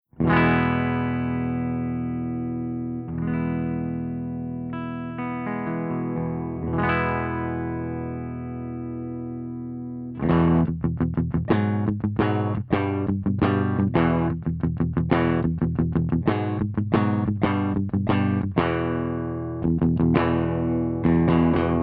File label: FENDER75 WARM BASSBOOST P90